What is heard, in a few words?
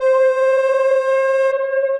Ghost Whistle; Effects; Ableton; Skitter and Step